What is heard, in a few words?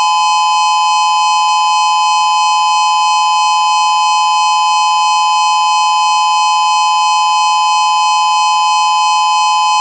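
Steady, outdoor warning siren; DSA: Directional Speaker Array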